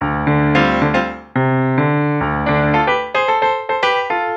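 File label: 110 NuJazz Piano D major; Digidesign; Pro Tools Essential Loops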